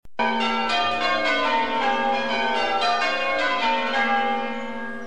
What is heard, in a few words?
rounds, stone ringers